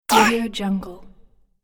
comedy death cartoon game app

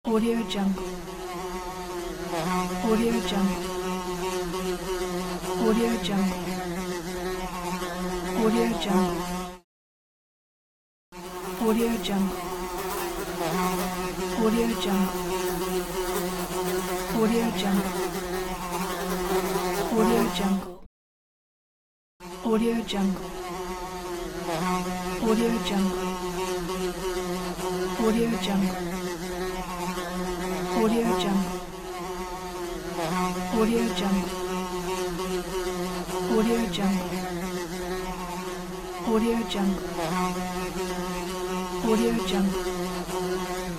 fly

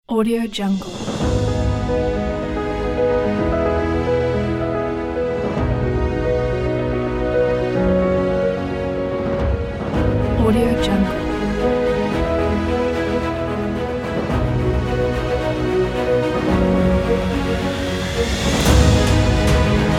epic inspirational

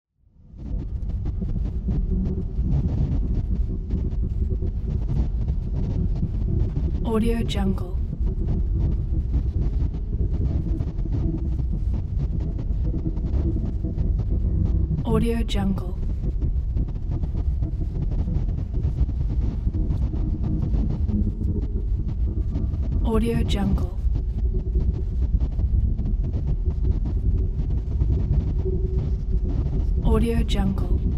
glitches

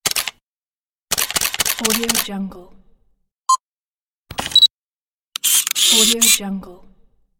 camera sound pack

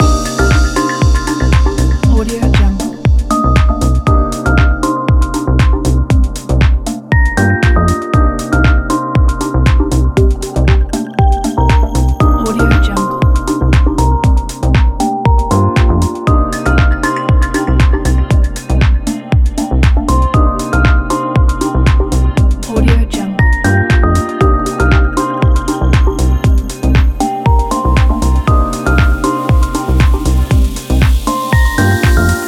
ambient house loop

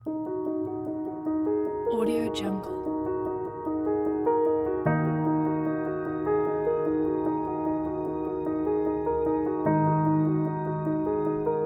documentary pack

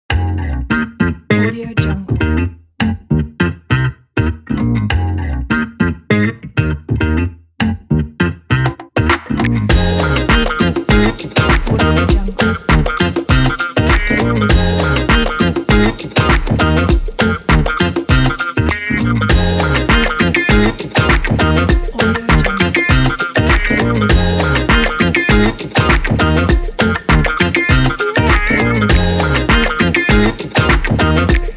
the groove funk

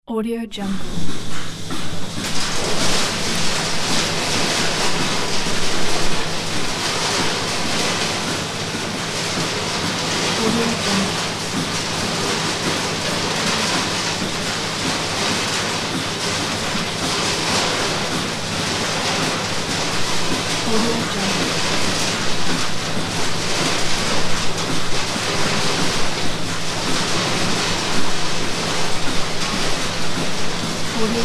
milking parlour ambience

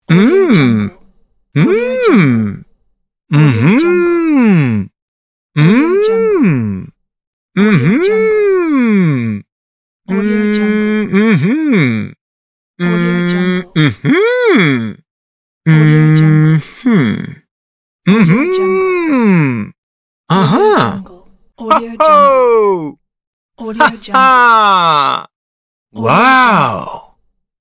male voice expressions

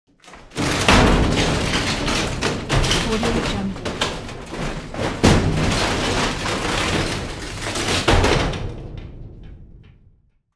destruction metal crash medium

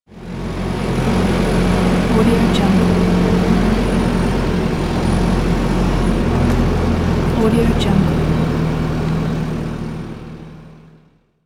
excavator; soundfood

